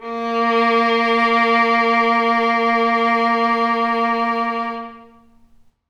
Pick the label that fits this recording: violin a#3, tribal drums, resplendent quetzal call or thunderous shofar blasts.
violin a#3